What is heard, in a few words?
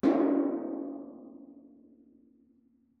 hollow metal bang; soundscrate